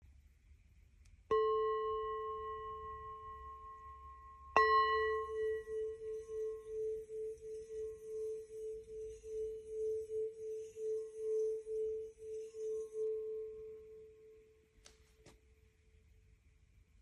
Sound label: Gold Dimple Lingam, 5 inch gold dimple bowl lingam